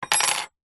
foley metal peg small thin set down wood table

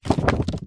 office file folder wallet card shake wobble short

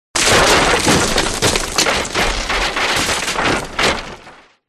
crashes wood; Audio Mangler

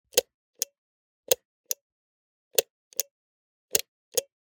Buttons Switches; soundbits

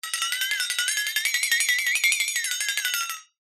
cartoon flexatone

